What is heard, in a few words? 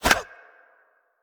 Power Shot Hit; We Are the Dwarves